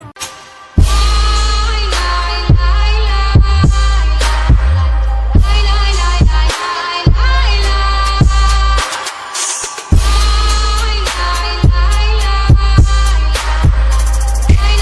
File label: Bass Boosted